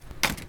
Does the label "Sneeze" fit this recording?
No